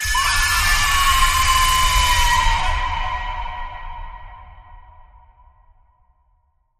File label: Wailing Banshee Scream